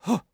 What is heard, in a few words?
attack voice; UFC Tapout; XBOX